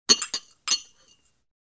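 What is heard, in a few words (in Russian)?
звон бокалов нескольких человек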